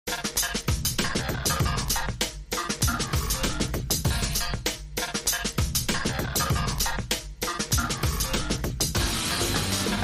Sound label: Turntable